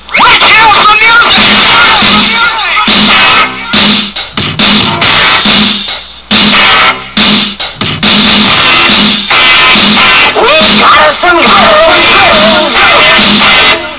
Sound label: some music